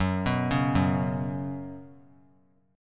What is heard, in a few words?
F sharp Suspended 4th; arpeggiated; F#sus4